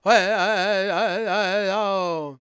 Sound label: G# MIN, World Voices, LAKOTA